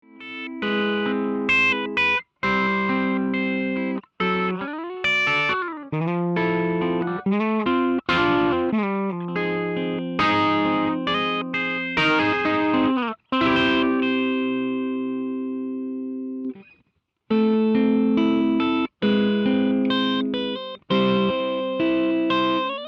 clean; SH-18

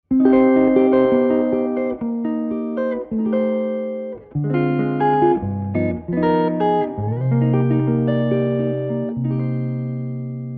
tone fender jazz

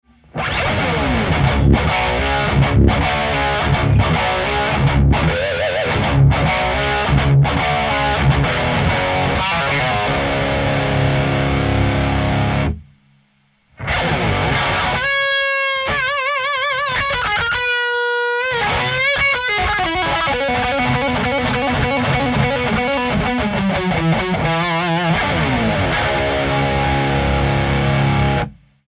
tone fender modern

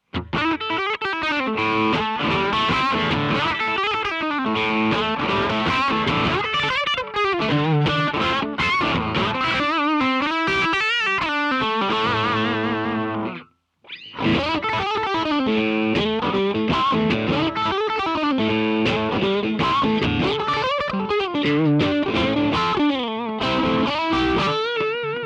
Classic Stack Plus drive; STK-S4

